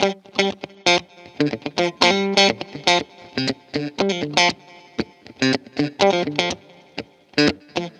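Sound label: GIT-CHRDLICK, guitar chord lick, Disco; Best Service ProSamples vol.48 - Disco Fever; AKAI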